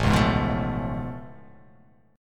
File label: A Augmented Major 9th A down